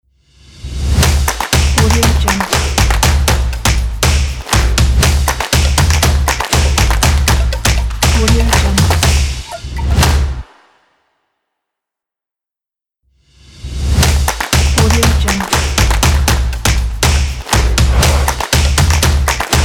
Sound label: stomp snap clap logo